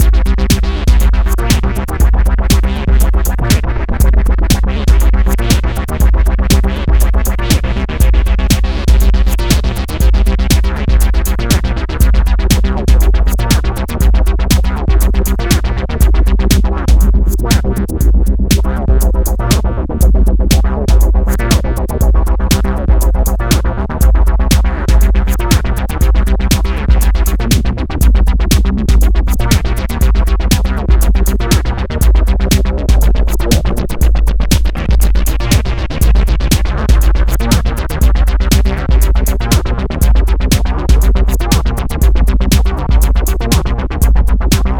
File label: bassline; mbsid